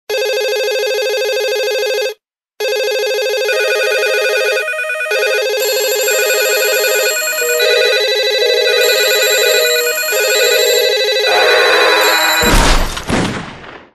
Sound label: phone possessed